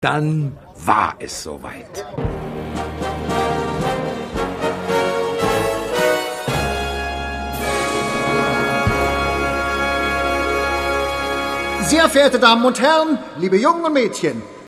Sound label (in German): Tusch